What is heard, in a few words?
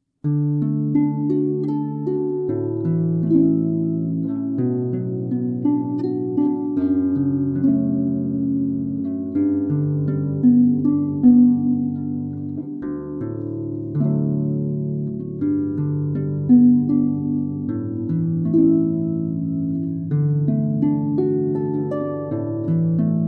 Harp